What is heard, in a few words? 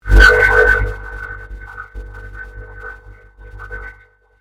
Convolution Surge; Sound elements to create more complex sound effects; Swell Sound Effects; Swells (Sound Effects:Sound Design)